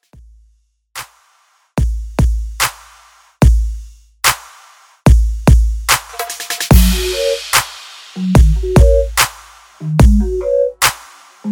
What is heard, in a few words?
Pop Redrum